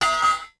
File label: pipe hit concrete; Star Wars Obi-Wan; XBOX